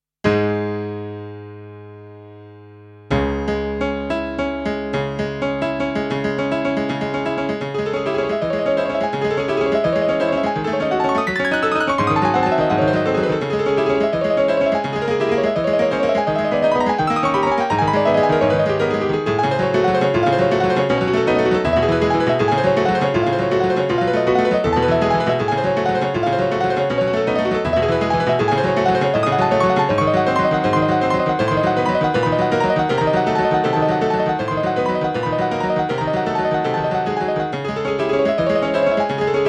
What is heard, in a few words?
TG55 Classic Piano